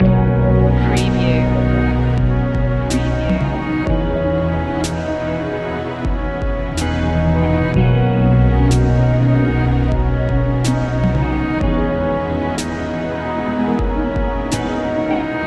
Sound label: deep technology loop